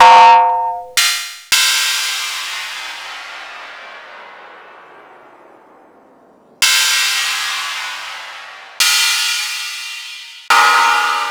Cymbals

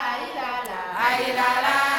120 CHANT

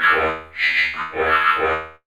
FX VOX; AMG - Vince Clarke Lucky Bastard

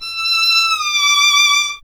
STR E 6, Solo Instruments Vln; Miroslav Vitous

